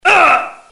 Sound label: grunt med; tf; max payne